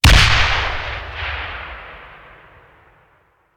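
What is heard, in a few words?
Artillery Grenade Launcher; Sound Ideas; Guns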